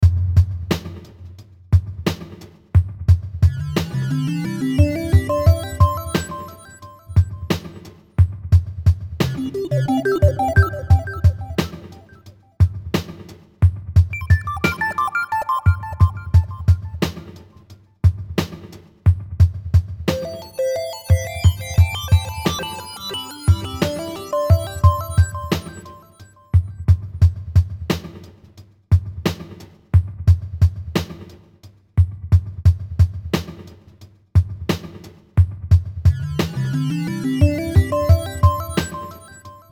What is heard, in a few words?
BEAT DELAY DIG, Beat Delay Digital Ascends; Miscellaneous Loops